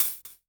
hi hat open, Korg ER-1; Kit 10

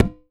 FRAME DRU, FRAME DRUMS; Voice Crystal - Steve Reid Definitive Percussion

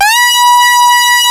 SAW GLIDE B4, SYNTHS; Kid Nepro; Elite

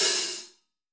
MIX MDHH, STUDIO ST HH

